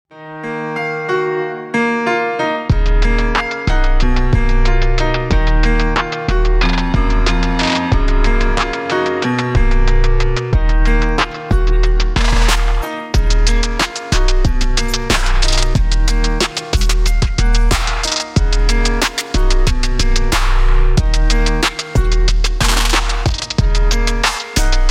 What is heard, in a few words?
instrumental